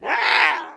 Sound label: parus attack